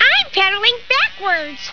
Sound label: pedaling